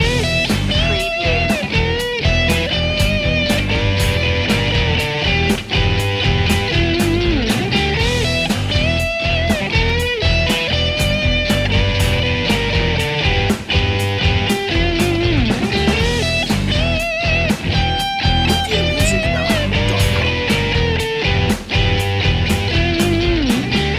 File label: bikers bar loop